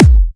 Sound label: Trance Kicks, Bassdrums